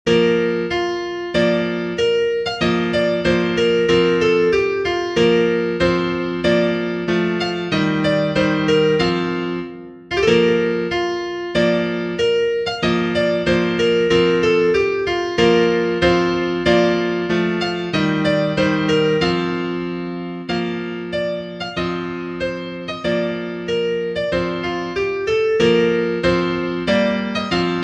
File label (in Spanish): Marcha Real Inno nazionale spagnolo